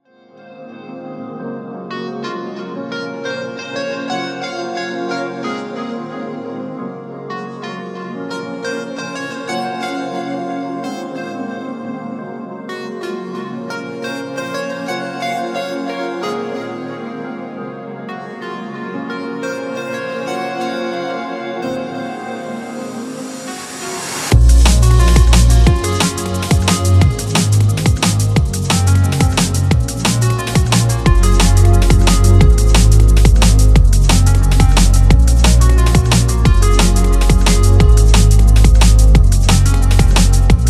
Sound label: hearing you breathe